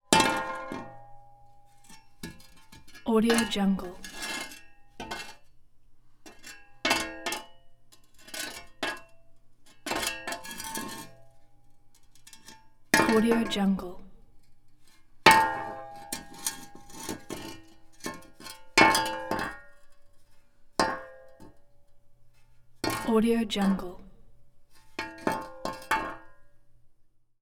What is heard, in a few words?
shovel hits wall soft metal ring